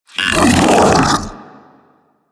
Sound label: Infested Splitter Joined Vocal Attack Medium; Warframe; Grey Strain